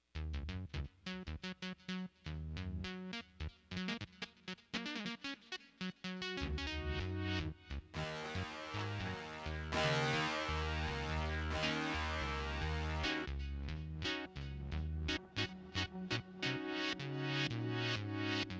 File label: orchestral nightmare